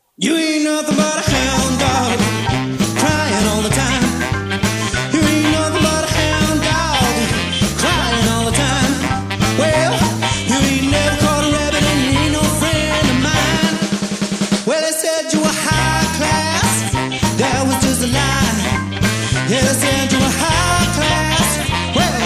Rock n Roll Band; North Yorkshire

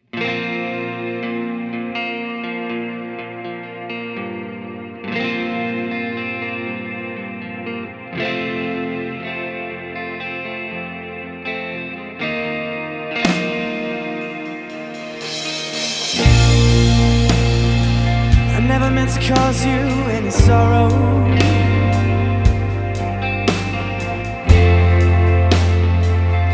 Rock Pop Band

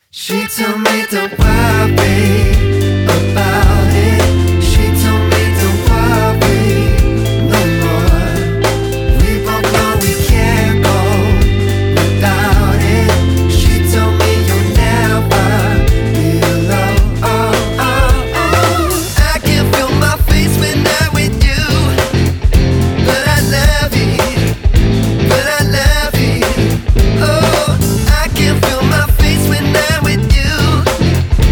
Rock Pop Band; West Sussex

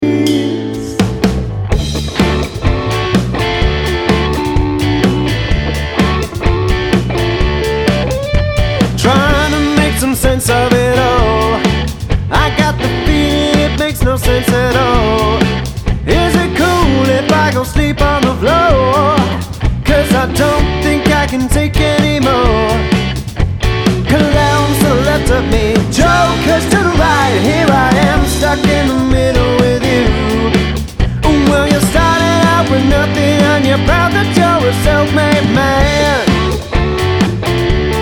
Rock Pop Band; Somerset

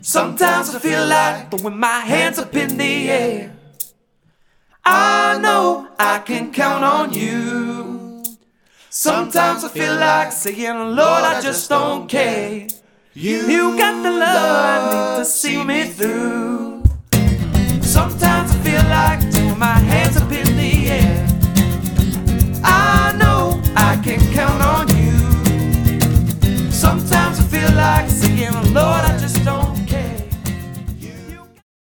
Roaming Acoustic Band

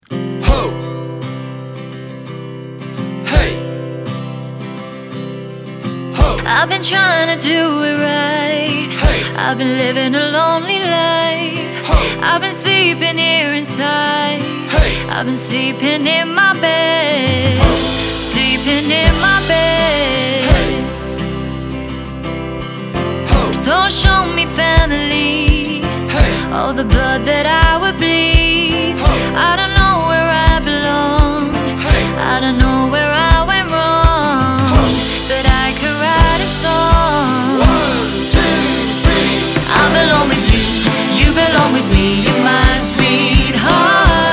Rock Pop Band; Hampshire

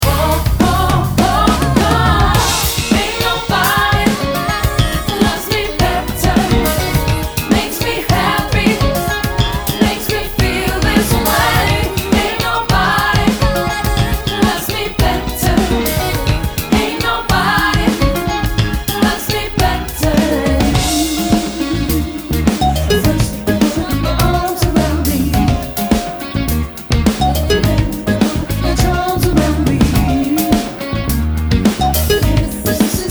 Rock Pop Band; Staffs

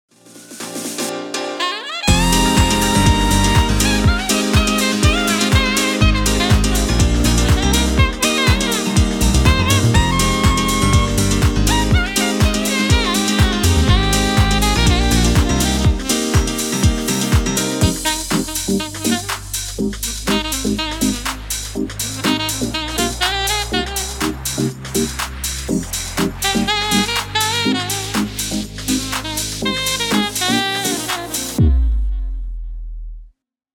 freestyle sax

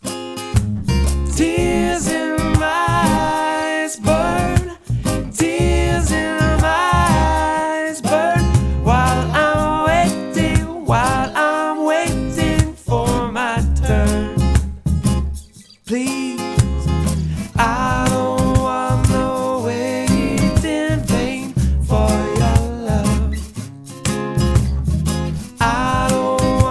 Acoustic Band

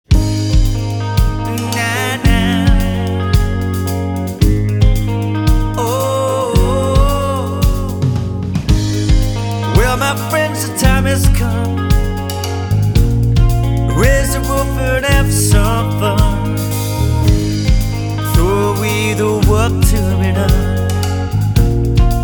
Rock Pop Band

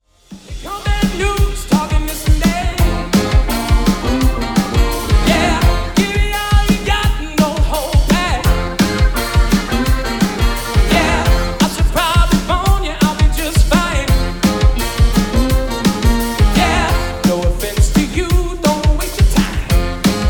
Soul Band; Surrey

